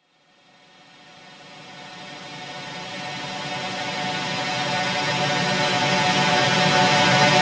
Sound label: 128BPM Chord B, Melody Fills; 4Bars; Cult; Vengeance Samples